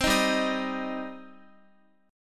G Suspended 4th Sharp 5th G, Guitar; Gsus4#5 strummed

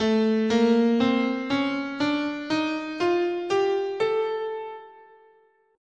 A Eight tone Spanish, scale

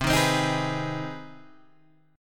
C Minor Major 7th Sharp 5th, Guitar, Open G

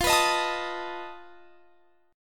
F 7th Flat 5th F down; F7b5; strummed

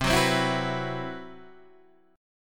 C Major 7th Flat 5th C, Guitar Open D

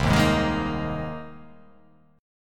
C Minor 7th Sharp 5th; down; chord shape

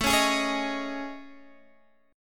A Major Sharp 11th

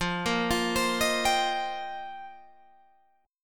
F 7th Suspended 2nd Suspended 4th, Guitar Open G

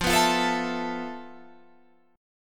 F Major 7th Flat 5th, F, down, Guitar, Drop D

